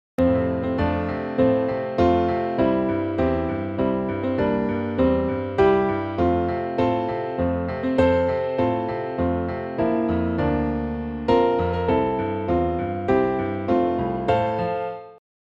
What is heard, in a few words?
Happy Birthday F Major alberti bass